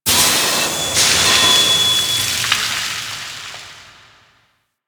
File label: Steam Blast Small, Ocean; Warframe; Grineer Ocean